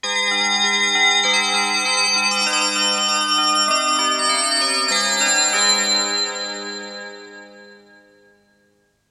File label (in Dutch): Belletjes zonder zang; jingles radio zoniën 1982-83